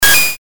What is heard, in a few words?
hit